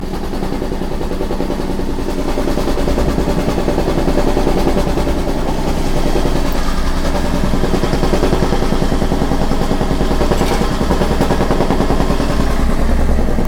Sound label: streets construction